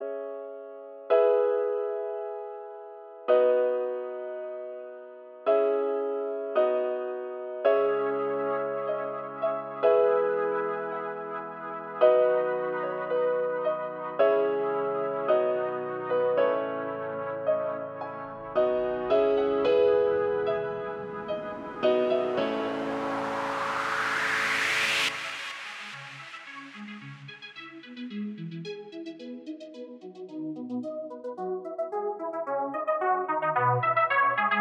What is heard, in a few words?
Harmonic Waves; Everfree Radio